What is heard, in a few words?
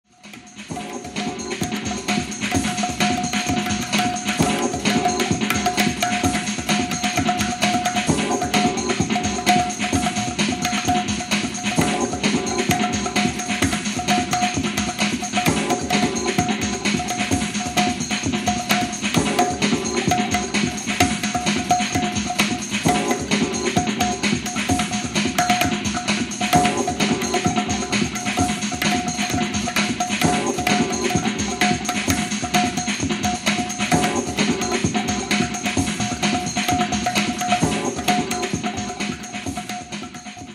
solo